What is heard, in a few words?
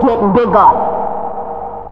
BIG VOX; Big Beat Samples & More; AKAI; VOX FX1 KIT